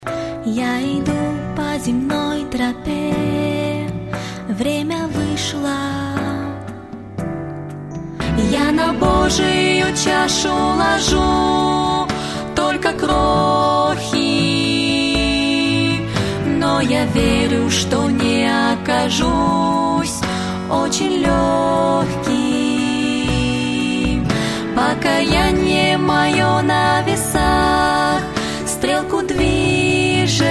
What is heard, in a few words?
louder chorus